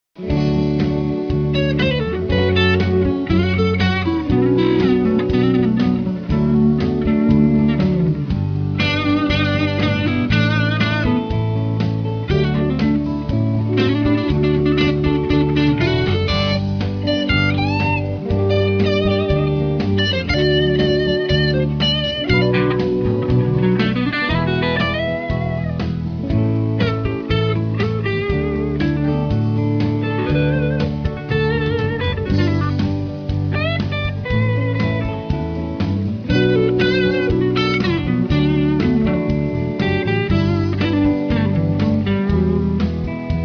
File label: On Hold Jazz